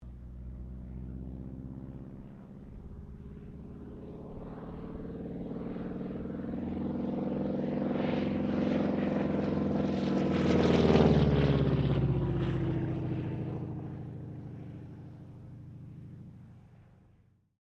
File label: AIRCRAFT T-28D TROJAN PASS; Airplanes